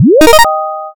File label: boot